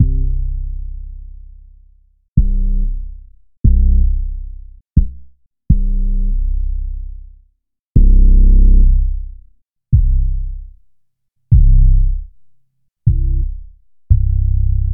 808 No EQ

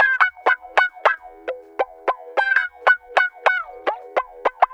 Guitar Loop; Ambient Grooves

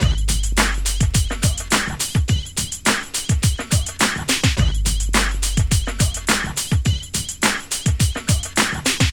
LOOP, Drum Loops

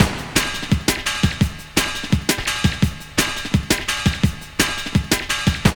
LOOP, Tekno Industrial; East West

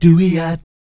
DOOEEOT, Vocal Planet Jazz & FX; ILIO

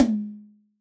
Yamaha DJX 2B, Rock Tom

